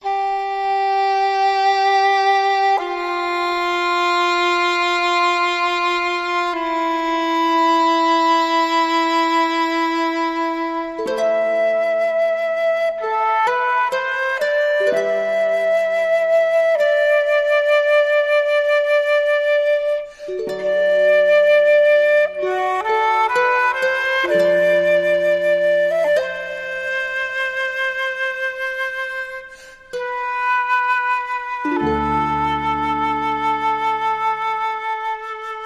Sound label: Harp Flute & Woodwinds